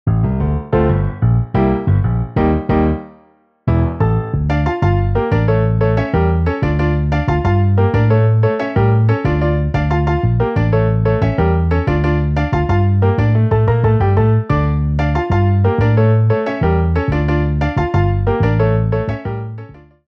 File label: Piano Bass MIDI